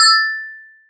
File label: cowbell